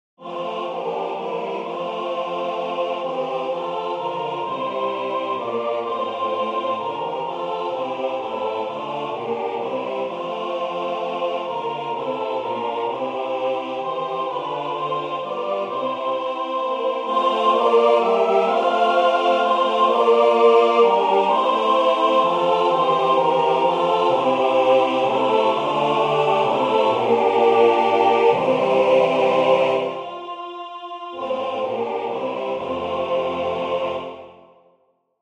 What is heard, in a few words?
sing lullaby lullaby baby all parts